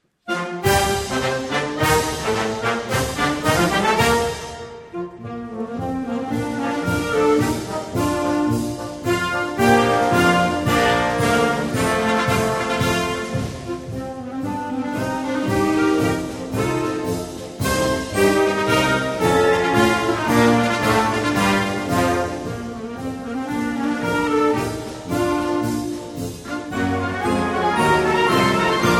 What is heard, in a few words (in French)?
Marche, blasorchester